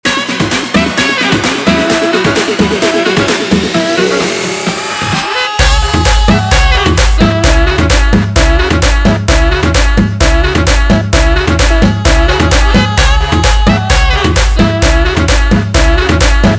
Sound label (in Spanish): guaracha remix 130 bpm